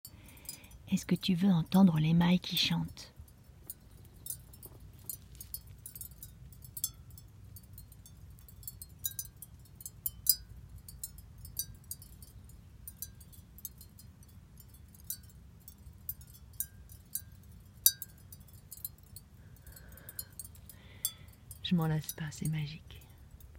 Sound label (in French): email qui chante